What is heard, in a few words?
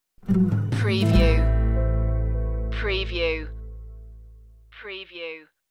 natural stinger